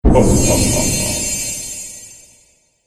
Pantomime Baddie Appears; Cartoon Sound Design Sound Effects

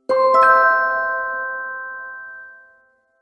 Game Unsure Fairy Twinkle, video game

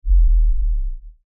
LFE Ultra LFE Hit, Lfe Ultra Low Bass Hit Impact; Deep Tones; Blastwave

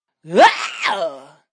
Vocal Funk Yell

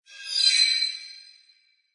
High Pitch Hit Feedback; Scifi Production Elements, Set 1; Science Fiction Sound Effects; Lynne Music

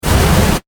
Science Fiction Flame Booster Short Burst; Spacecrafts

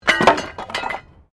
METAL SPIKE FALL; Materials

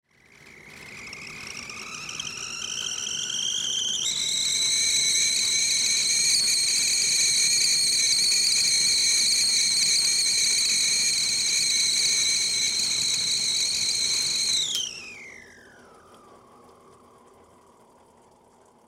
Teapot Whistle, Teapot Whistle Short Tea; Tea Kettle; Household; Dishware

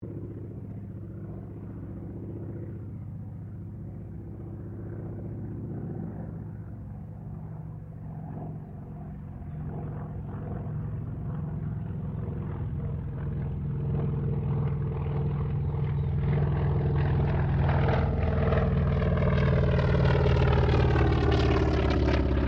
AIRCRAFT CA-28 CERES PASS; Airplanes; Vehicles; Aircraft Collection

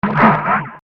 Deep Hollow Robot Sound; science fiction sound effect; star wars / trek style games; Andrew Fleming

